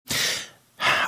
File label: inhaling exhaling